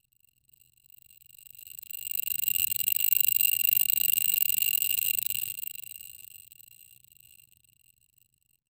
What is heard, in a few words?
a soft bling of a, powder